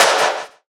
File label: SNARE; E-Lab; X Static Goldmine 2